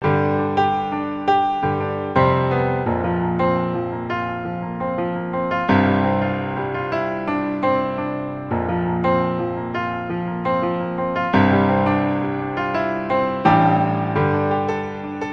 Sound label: Introspective Piano Etude